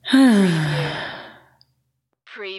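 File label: Female Relax Sigh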